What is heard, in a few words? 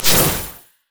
lunge; LoL; fiora